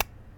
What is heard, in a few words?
Hair Pin